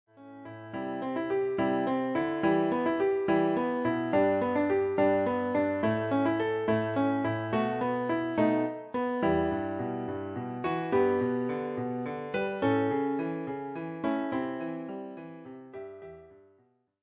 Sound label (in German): The House of the Rising Sun, Klavier